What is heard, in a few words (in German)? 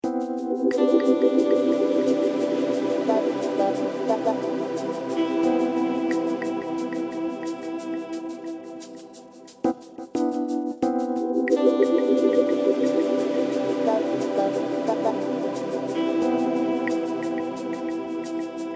Musik DJ Band